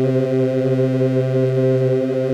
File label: VOX C3; XPND VOX; Greytsounds; Scott Peers Sound Engineering